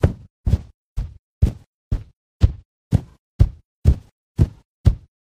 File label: Big Green Stomping Loop